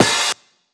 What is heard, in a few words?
Open Hi Hat